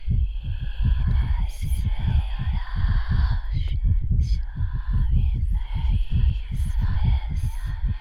heartbeat fast; yamiboss

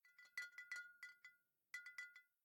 Bottle Shake; The Town

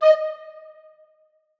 end 102bpm; theme confrontation part03

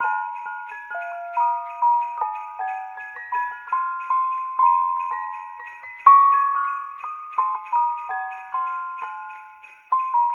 Idle Present Loop; Christmas 2021

